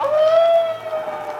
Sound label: "ooh" nongender